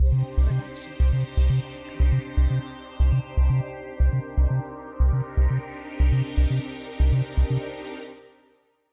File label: late news; soundscrate